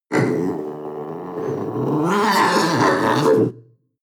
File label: panther young growl